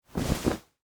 Wool Coat Fold, Clothes, Over, Arm; Foley; Dressing

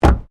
Car Ford Focus Door Rear Close

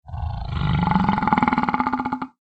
horror monster growl; Monster / Creature Growl; Monsters; McKinney Sound

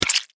Insects Bug Hit Windshield; Miscellaneous Insect; Animals

